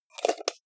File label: jar plastic midsize close fast